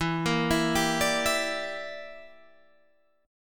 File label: E Minor 7th Flat 5th E; Em7b5; arpeggiated; 12,13,14,12,15,12